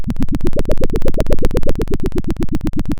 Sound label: Sound of a helicopter